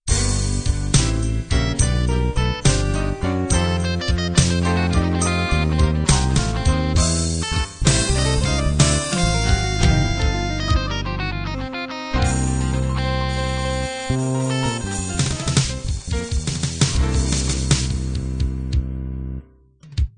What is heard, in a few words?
slow blues end